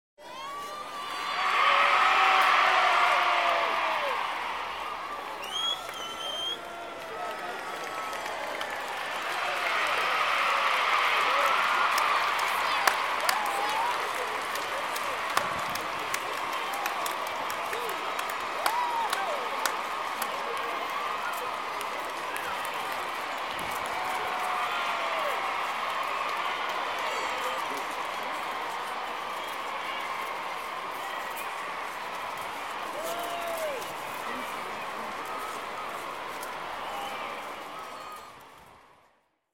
Street Crowds - Cheers For The Queen Outside Buckingham Palace; Human Crowds, Children & Footsteps